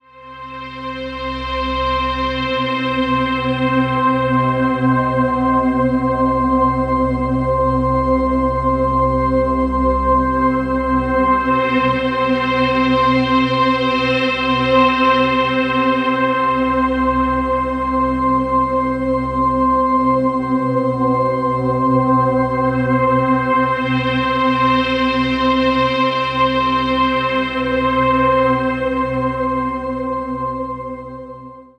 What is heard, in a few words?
Atmosphere Waves C; Ghosthack